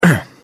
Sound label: pain